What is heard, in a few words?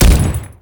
Ghoul Devourer Step Default, Footsteps; Warframe; Grineer Ghouls